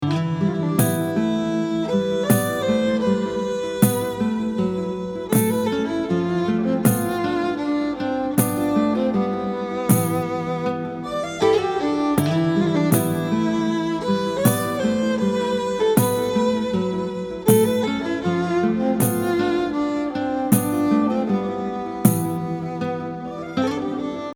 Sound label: Sections Mountain; westerado double barreled; Moutain M3